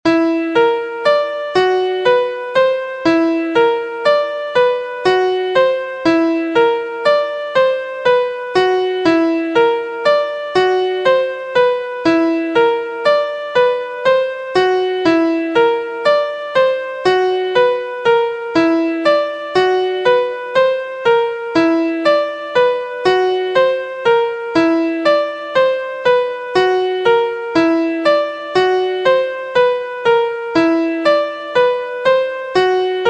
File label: Degree 1 b2 2 b5 5 b6 2nd Inversion Key Bb; harmonic and melodic equivalence; trichord pair